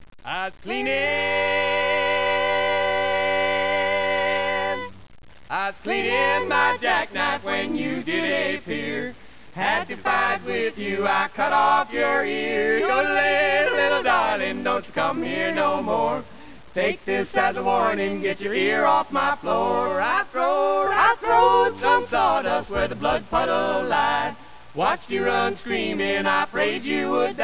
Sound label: knife